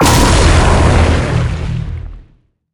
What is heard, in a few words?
hit fire; smite; heimdallr